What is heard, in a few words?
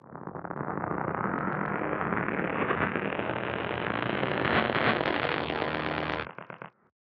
Movement; 99Sounds; InterSpace